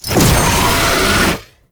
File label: brimstone impact start; Roathe; Warframe; Tau; Abilities